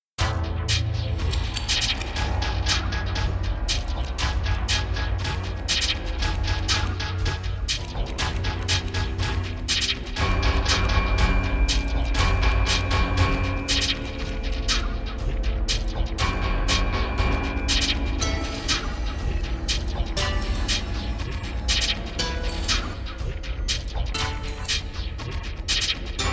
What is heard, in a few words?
gamelan; Indonesien; Bali; Java; Samplelogic; Gamelan Musik